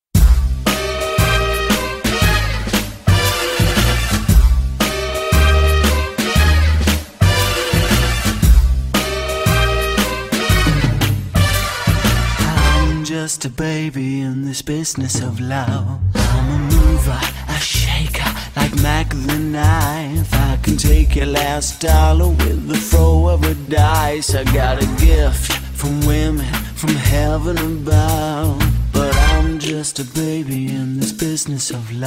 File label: SLOW FOXTROT 29 BPM